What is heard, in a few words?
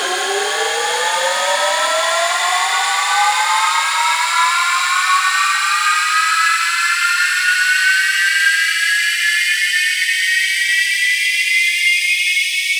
riser